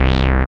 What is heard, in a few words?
SYN 202, Roland MC-202; Sound & Vision - Gigapack I CD 2